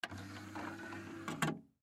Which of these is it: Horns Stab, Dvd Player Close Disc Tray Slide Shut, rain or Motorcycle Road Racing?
Dvd Player Close Disc Tray Slide Shut